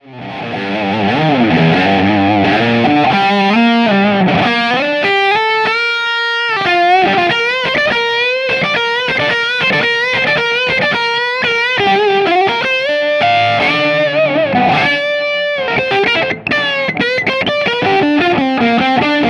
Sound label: Lead Strat; Gain 12; Treble 12; PPIMV 17; VVR 17